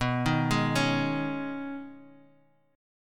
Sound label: B 6th Add 9th B, chord; arpeggiated